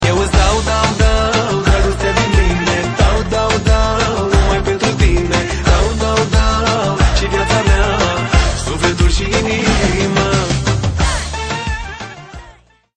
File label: European Beat